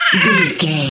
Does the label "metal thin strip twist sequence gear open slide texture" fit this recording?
No